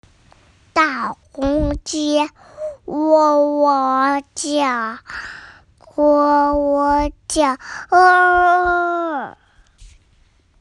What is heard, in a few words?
Rooster Crow